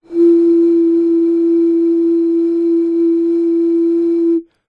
foley blowing air over top of plastic bottle long; zapsplat

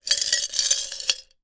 broken glass pieces inside glass jar movement; zapsplat

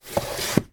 book slide into bookcase; household; sound effects; sound effects one

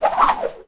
foley rope swinging swish air; sound effects one

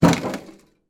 food chocolates tin shake single; sound effects one

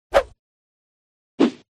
CARTOON Swipe; FX; smartsound

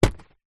household first aid kit pouch fabric drop carpet

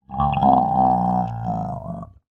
horror monster ill or dying moan

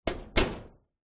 door metal thin close gentle; household

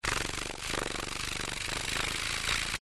animals insects moth wings flap fly close

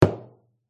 pub bottle full down on beer mat; field reports

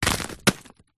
small rocks rubble drop pieces; foley; zapsplat

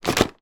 box of chocolate truffles single shake; food

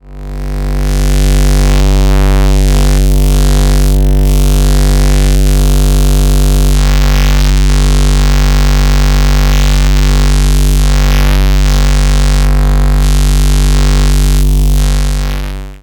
Drone

